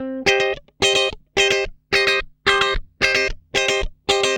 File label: GUI, 110 BPM